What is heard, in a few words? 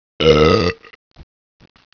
belch